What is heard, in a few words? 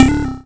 Power On, 8-Bit; Games And 8-Bit Kit Volume2; atomsplitteraudio; chiptune